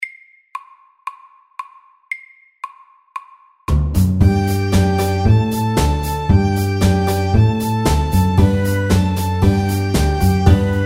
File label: Square dance MIDDELS